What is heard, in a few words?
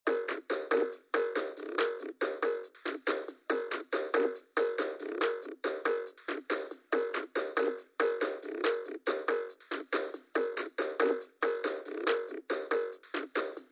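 tinny fun band beat, 140 bpm; SAMPLED MUSIC LOOPS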